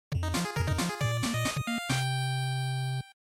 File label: Goal; GB; Sports